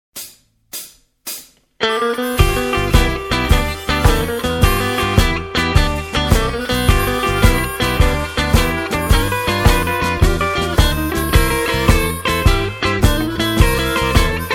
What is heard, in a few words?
blues jazz